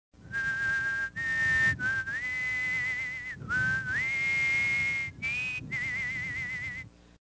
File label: whistle; Soldier Of Fortune II Double Helix; XBOX